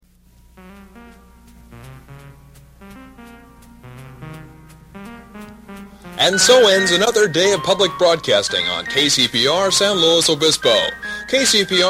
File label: signoff jazz